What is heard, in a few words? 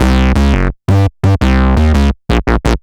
Bass A dist 170 C, drum n bass 170bpm; musicradar; Basslines A